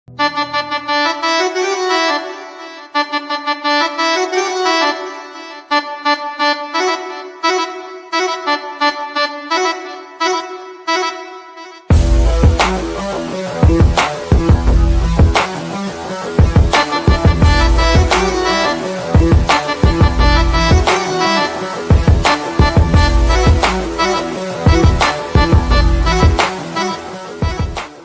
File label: instrumental ringtone bgm ringtone